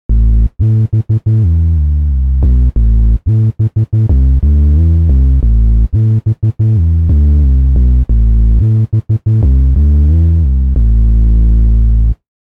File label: Dub Bass - Rez Synth Pad; CRTIV Shumovick